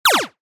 leisure video game retro laser gun fire

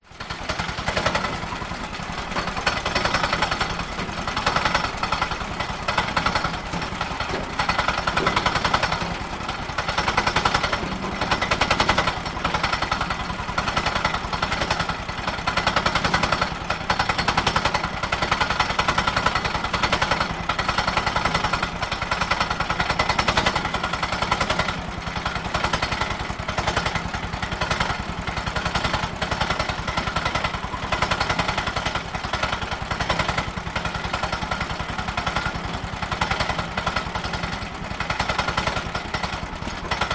woodchipper petrol motor engine idle running slow; industrial

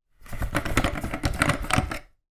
household lightbulb unscrew from lamp

